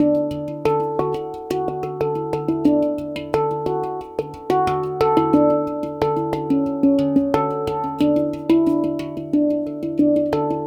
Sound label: 90 A maj Hang